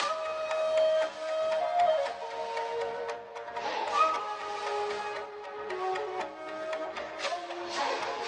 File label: CHINESE ERA BPM 116